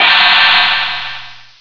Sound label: large cymbal; Flashback